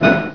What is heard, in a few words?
sledge; Railroad Tycoon